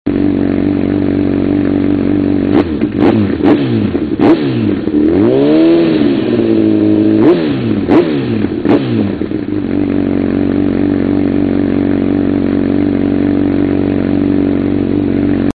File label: CBR1000RR twin outlet slip on, exhaust sound; honda; Micron